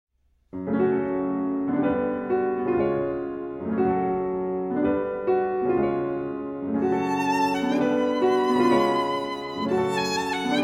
Pieces For Violin and Piano, Un Poco Triste

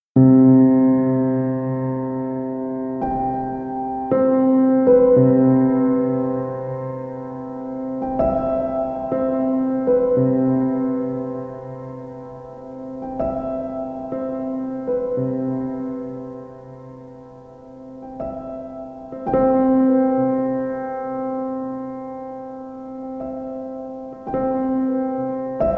Piano Sounds

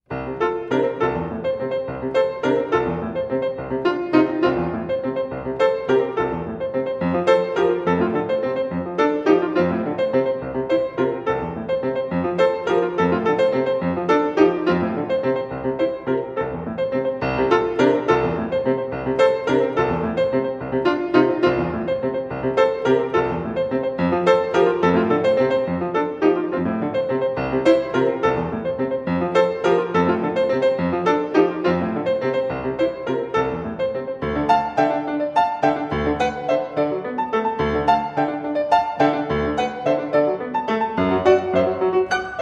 Studies in African Rhythms; 24 Studies in African Rhythms

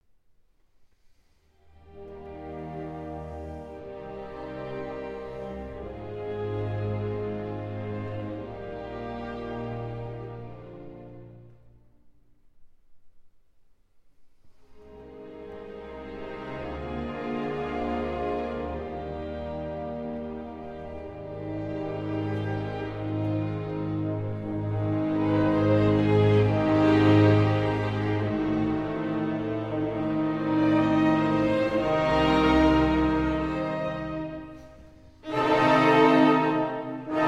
Piano Concerto No. 2 in B Flat Major

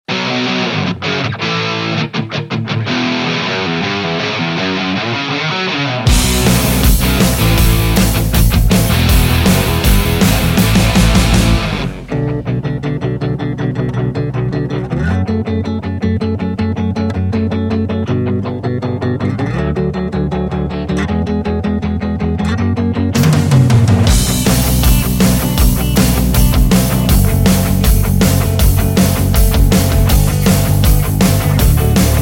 Karaoke Version; Eurovision 2022 - Bulgaria

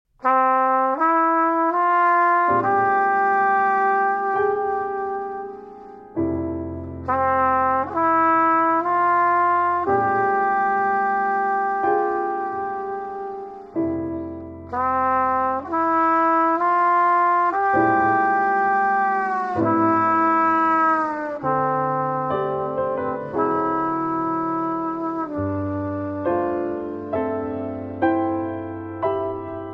Instrumental Version

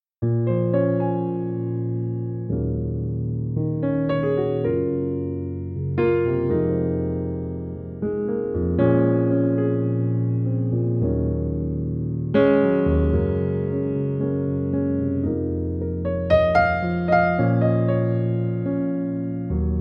Piano Version

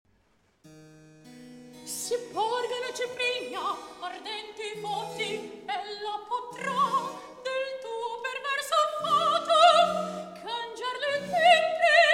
Recitativo; Si Porgan à Ciprigna; Polifemo

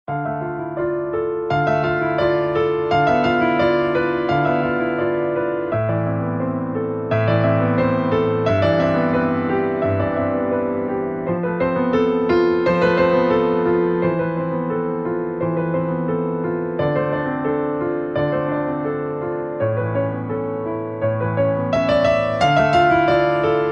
Tikkoun (Piano 1)

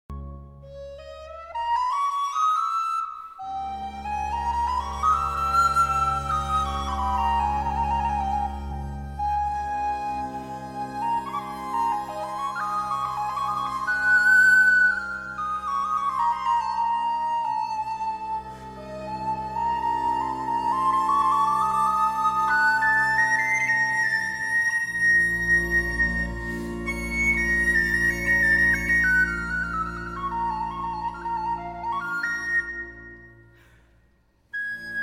Adagio, For Recorder, Strings and Continuo

